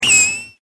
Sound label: kitten